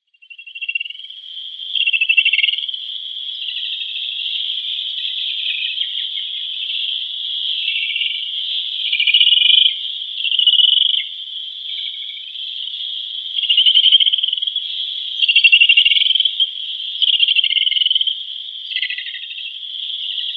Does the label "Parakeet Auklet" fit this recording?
Yes